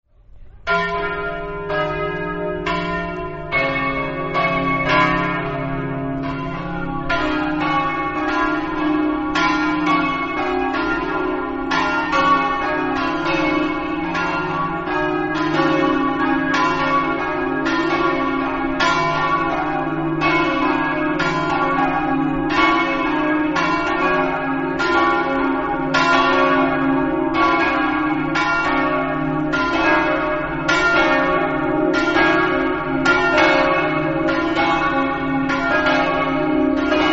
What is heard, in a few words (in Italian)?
maniago, campane